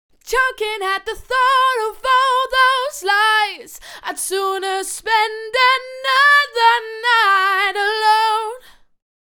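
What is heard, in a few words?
120 Am, dance vocals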